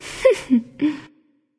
heh heh; Smite; artio

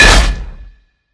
recall trident hit; smite; poseidon

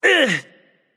grunt hit; cernunnos

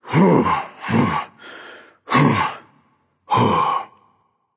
nv breath, vox; smite; voicepack; vulcan

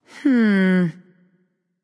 NV Thinking; smite; awilix

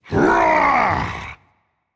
battlecry rage; chaac

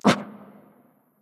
hitreact human male small

